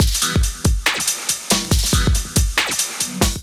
Kit Melodic 140 bpm, Drums; Ableton; Skitter and Step; Pico Dilly Kit

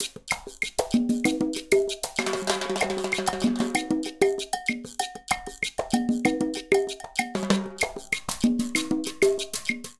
Songo 96bpm, Latin Percussion; Ableton; Factory Packs

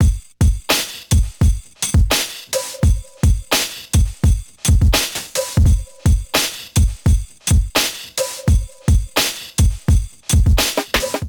Kit 85 bpm, Drums; MIDI Clips; Around the Corner; Ableton; Factory Packs; Chop and Swing

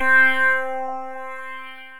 Golden Foliage, Synth Keys; Wavetable; Ableton; Synth Essentials

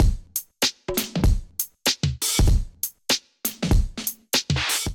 Groove 97 Bpm, Drums; MIDI Clips; Ableton; Factory Packs; Chop and Swing

